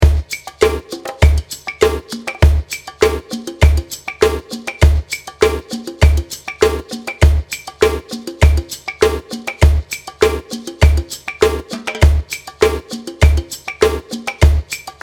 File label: Talk Law DRUMS